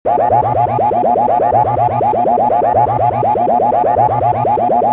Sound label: super bounce use